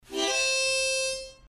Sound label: harmonica